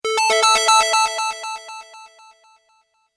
Multimedia Alert Sound Effect, Medium Length, Chime; Chimes; Chime Sound Effects; Chimes (Sound Effects:Multimedia); Stuart Duffield